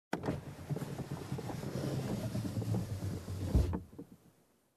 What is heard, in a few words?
Car window downlift